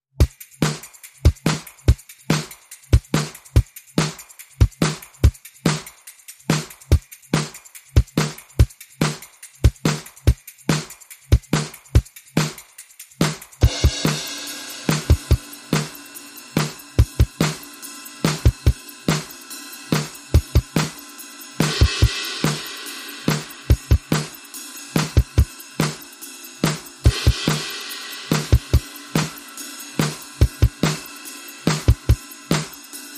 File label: Drums Only